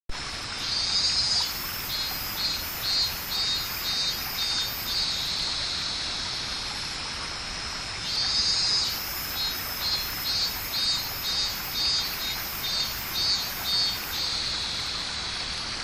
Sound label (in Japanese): minnminnzemi